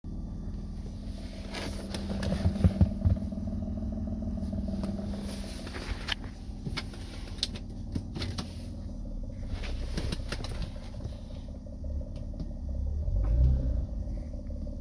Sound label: pathfinder strange noise; nissan pathfinder sl non 4wd